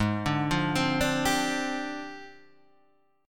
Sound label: F Minor 6th Add 9th, guitar chord